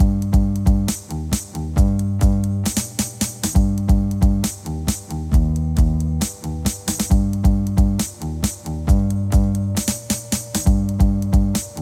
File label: rock funk